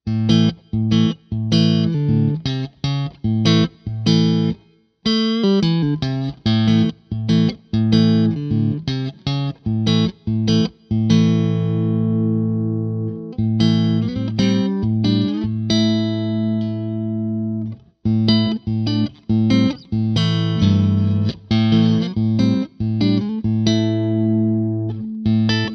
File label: Neck, pickups